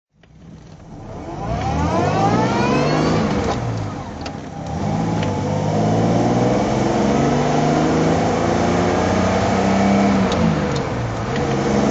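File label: jaguar xk150 s fhc drive, Engine sound driving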